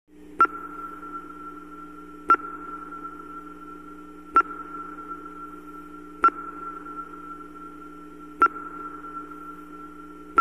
Spaceship Ambience; Sonar Blips With Echo And Transport Ambience.